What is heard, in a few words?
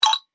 xylo